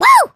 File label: gorilla hit; cannon vo